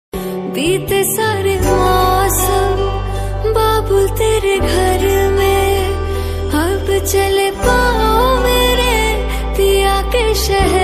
Vidai Song Sad Song

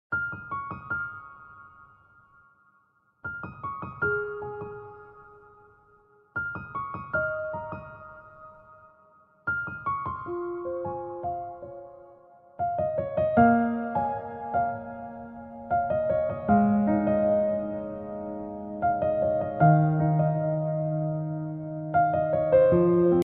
Flute Music

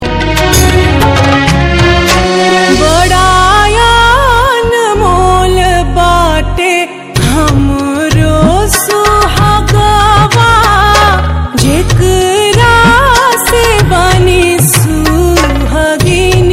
teej special song

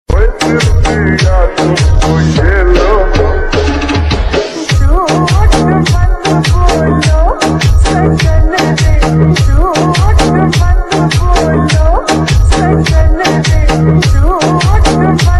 DJ remix song

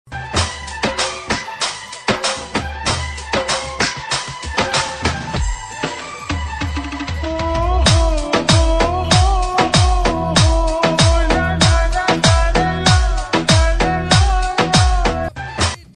Love BGM

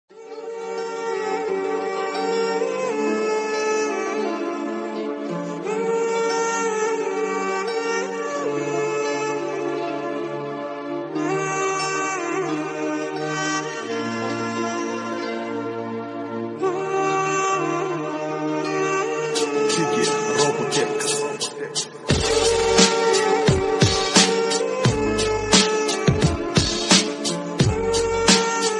Background Music

